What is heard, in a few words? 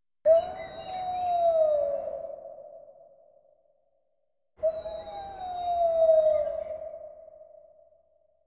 Scary Sounds Wolf; ringtones